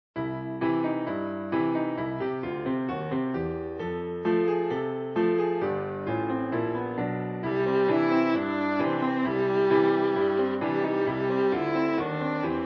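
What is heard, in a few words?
viola; Jingle Bells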